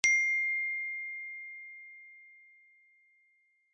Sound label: Note iPhone SMS